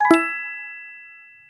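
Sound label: notification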